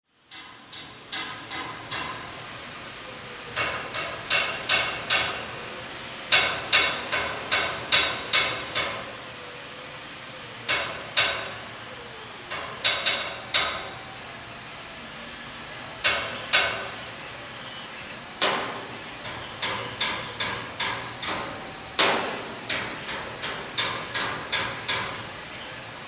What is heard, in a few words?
Industrial Factory